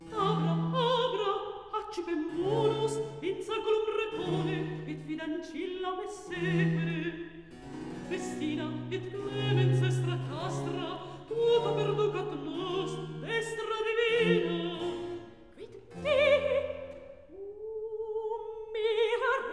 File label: recitativo